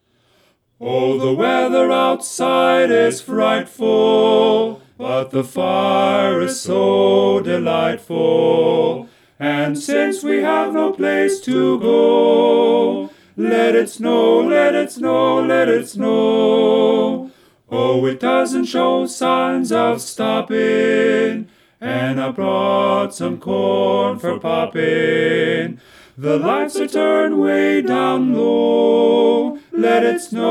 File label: bari right